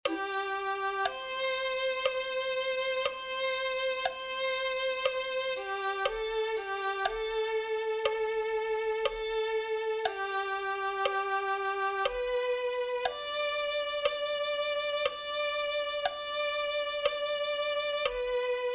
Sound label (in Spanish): violin